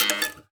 Classical Guitar Extended Technique

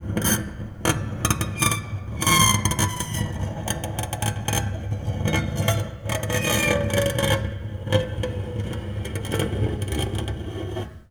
String Scrape, Piano; Piano Miscellaneous

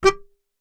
Melodica Short Note

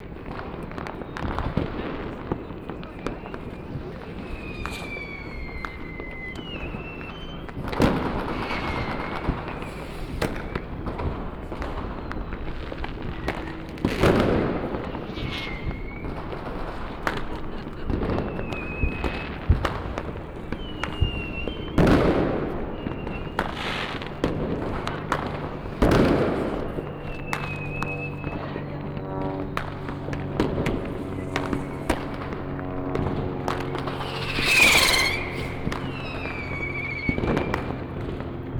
Fireworks

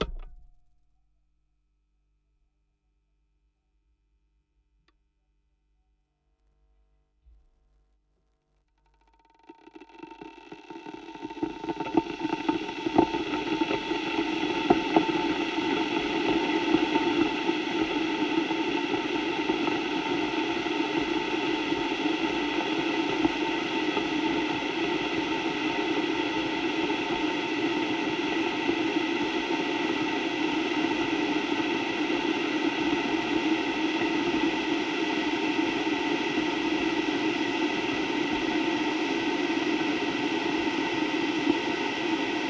Boiling Kettle Hydrophones; Kitchen

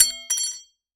Aluminium Tube Drop

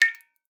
Drips

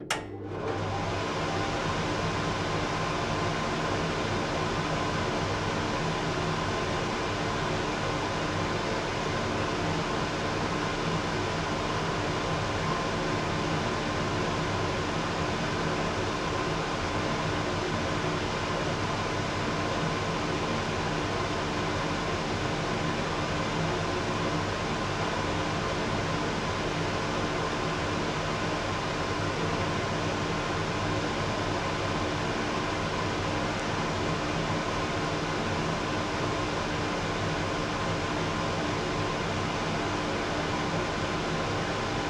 Extractor Fan Kitchen; Extractor Fans

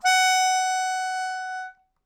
Melodica Long, Long Notes Chromatic

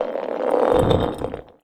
Glass Drag, Medium Wine Glass

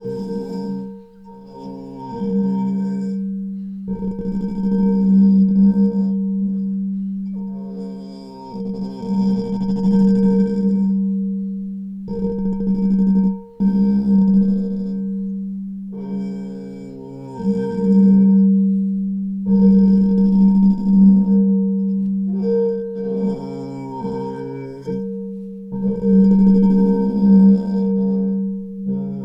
Finger Rub, Large Wine Glass

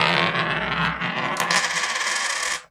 Coin Roll; Coins

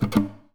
Classical Guitar Double Damped Pluck; Classical Guitar Extended Technique Stereo

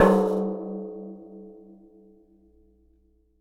Washing Machine Drum Inside Strike

